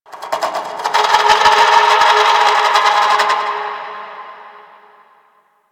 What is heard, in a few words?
Misc Creepy, Ambience; Warframe; Entrati